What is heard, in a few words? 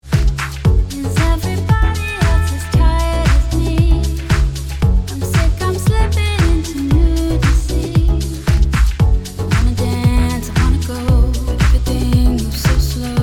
Dance Electronic